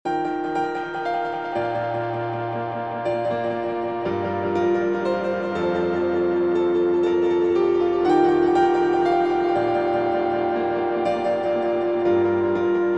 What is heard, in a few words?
piano wet; soliton; chiral audio; promised neverland